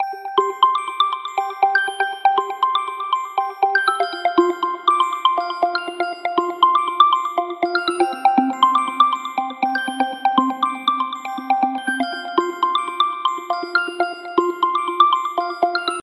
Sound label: lenovo k8 note ringtone